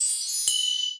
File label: coin flip; coinrain